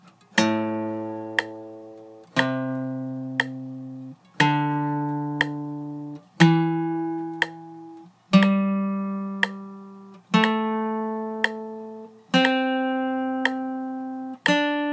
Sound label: Am Penta Half Notes